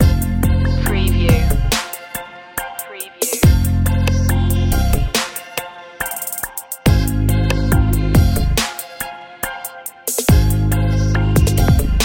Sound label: your own trap loop